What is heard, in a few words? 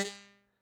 Harmonica Hohner Super64 Normal release G2; Aerophones; Releases; Free Aerophones